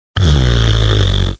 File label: Tongue Goblin